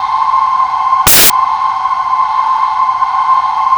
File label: CRIK CHOIR; Spectrasonic Distorted Reality 1; CRICKETCHOIR